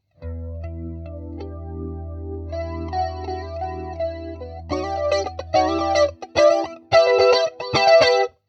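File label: med flanger bass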